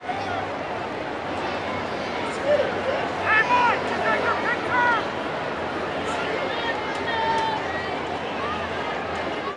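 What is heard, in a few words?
baseball crowd ambience chatter; Crowds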